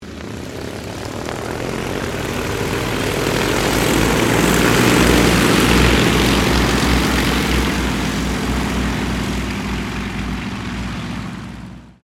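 AIRCRAFT YAKOVLEV YAK-52 SQUADRON TAKEOFF, Russian Training aircraft; Airplanes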